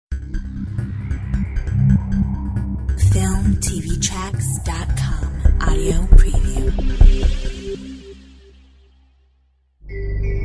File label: Logopack electro; Filmtv-tracks